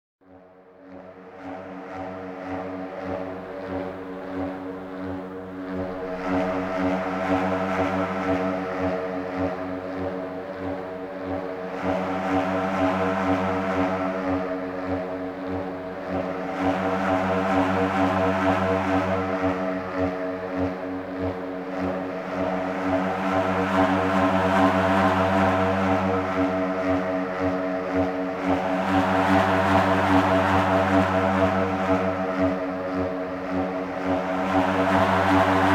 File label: spiritcatcher F#; best service Blue Box